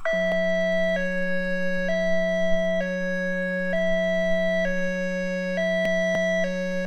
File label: HI LOW & Howler